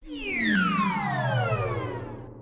dark lord die

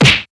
enemy hit

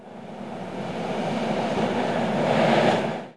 field wind

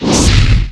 explosive powder

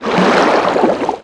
spirit water l attack

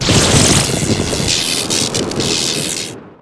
tornado storm

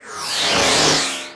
machine

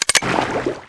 grenade fragment water; night watch

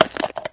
scorpion die

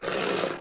ghoul attack

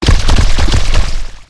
summon elemental diluvio

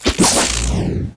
knight brandish spear

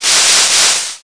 nyang grass

